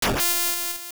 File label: TitleAppear; The game's title screen appearing